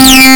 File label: Acid Bass C4; Digital Sound Factory Xtreme Lead